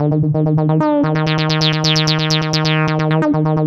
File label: D#, synth line; 90 s Sample CDs; NiceBeats; SynthLine VOL-3